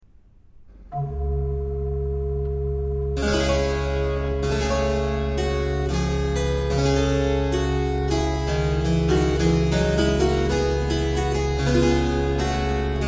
Toccata Per L'Organo Col Contrabasso Overo Pedale In G Minor; Frescobaldi, Girolamo Alessandro (1583-1643) [Italy]